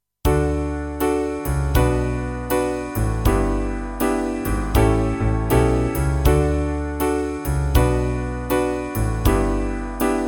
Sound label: Backing